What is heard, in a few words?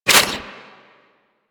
Roulette Projectile Jam; Warframe; Cowgirl